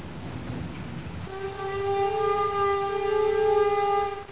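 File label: truck stop; 10,000 Graphics Pack Volume 1; Media Graphics International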